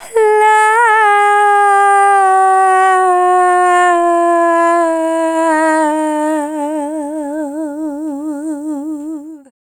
Dance Vocals, FEMALE

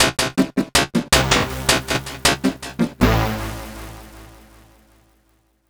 Synth Seq; Keys; Synth Sequences; Drum & Bass

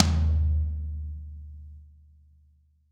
tom maple

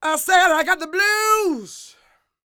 SING W, Vocal Planet Blues & Soul, BLUESY; ILIO

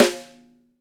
XV Snare, Roland XV-5080

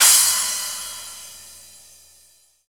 Crash, EDM; Sonic Foundry (Sony Creative Software); Afterhours EDM

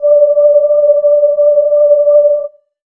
synth sfx; Chillout (ambient1&2)

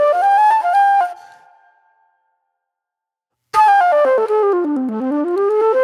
Mellow Soul Flute

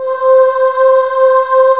ST. CHOIR C5; USB Soundscan vol.28 - Choir Acoustic & Synth; AKAI

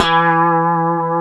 E 3, Guitars; Spectrasonics; Hans Zimmer Guitars VOL-1; 90's Sample CDs; COMP POP+VIB

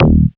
HARD, Synth Bass; SYNTH-Basses; Sound & Vision; Gigapack I CD 2

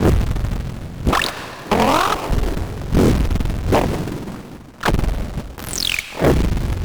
weird glitch sound

